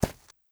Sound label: horse footstep grass hard; Rust